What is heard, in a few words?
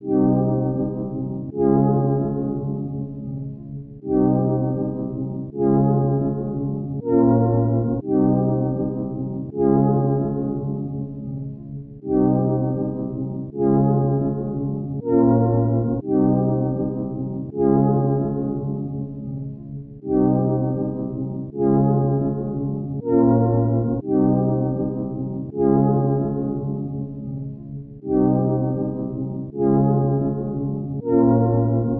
120 BPM F Min Synth; Loop Stems; Cymatics; 2022 Melody Collection Platinum Expansion; Frozen